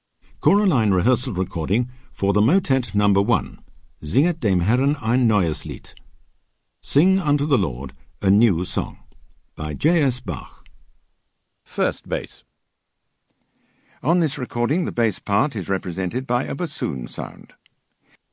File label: Bass; Bach Motets 1&5